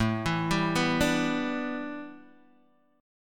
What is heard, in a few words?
A 7th Suspended 2nd Suspended 4th Guitar Open D